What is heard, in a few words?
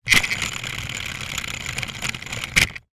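toy remote control car wheel spin air motor off; zapsplat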